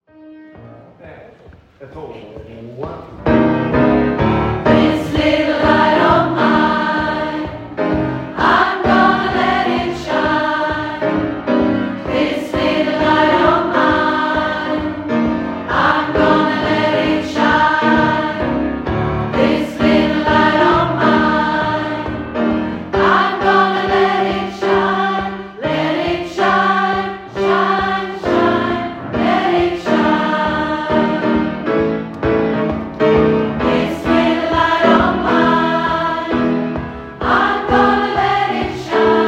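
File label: This little light & Go tell it, Alto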